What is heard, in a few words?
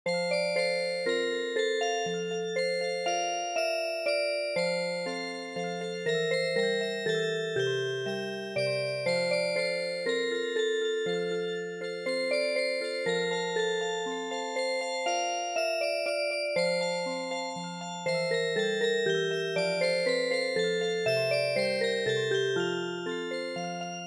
waltz Music Box; traditional